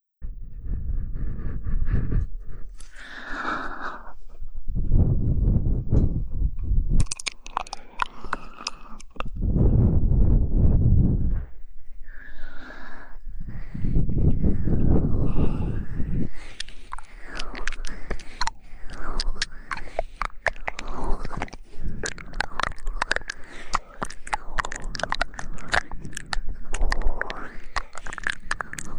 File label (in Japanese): 唾液たっぷりASMR エッチなお姉さんの唾液グチュグチュ音と喘ぎ声